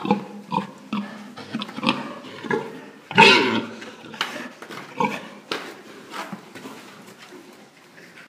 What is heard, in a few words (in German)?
Unwilliges Mastschwein